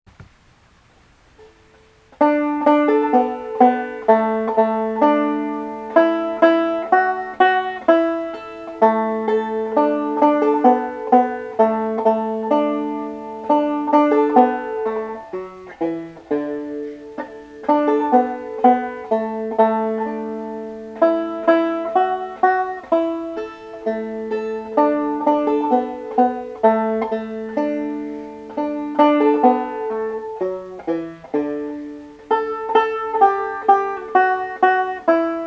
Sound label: arkansas traveler, banjo